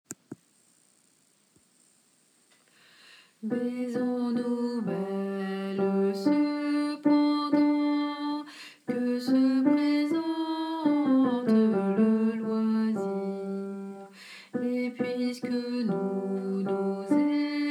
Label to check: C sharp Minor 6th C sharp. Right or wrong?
Wrong